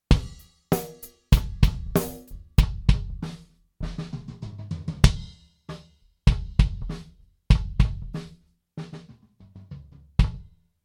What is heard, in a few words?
Comp Kick; Drum Recording Setup